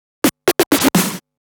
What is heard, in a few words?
Scratch Fills 140BPM